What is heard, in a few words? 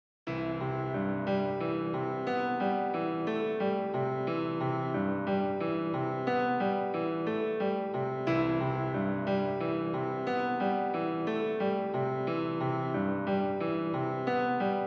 Calm Dive Background Music